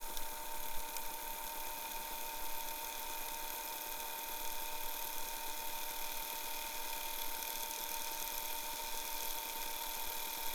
CASSETTE TONE Motor only, rewind, button perspective